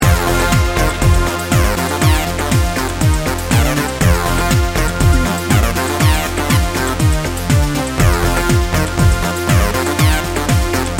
dance party

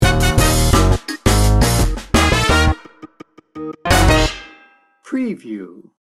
chillout funk sting bumper

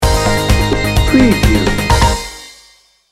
driving ambition sting bumper

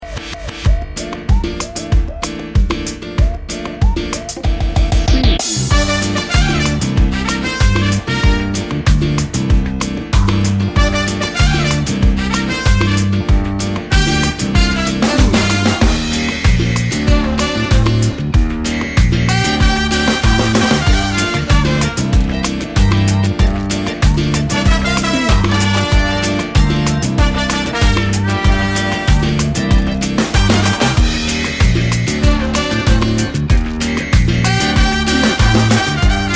samba party

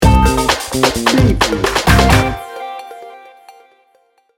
good time funk sting bumper

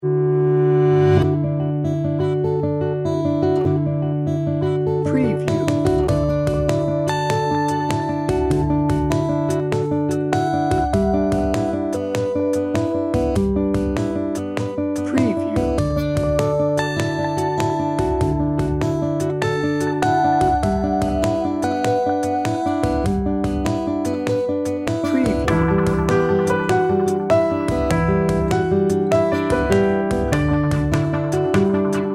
sweet and mellow

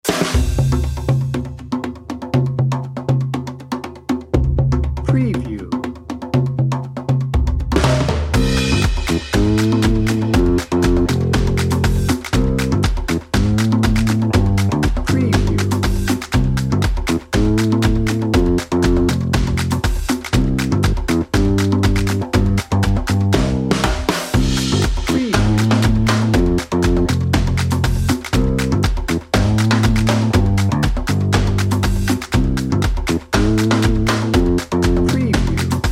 tropical festival dnb

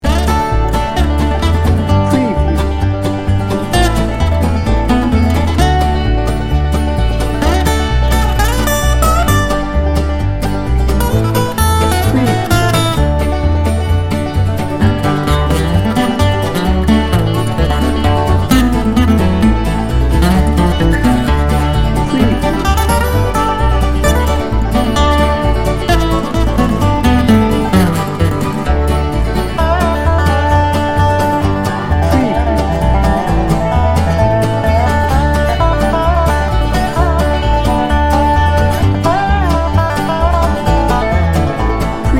bluegrass no drums

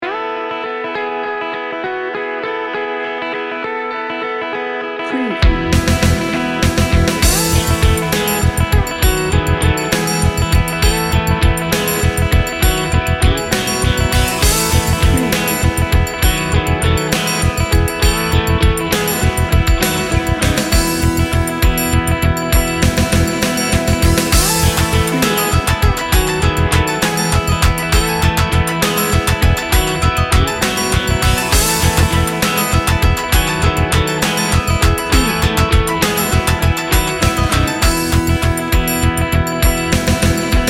celtic pop

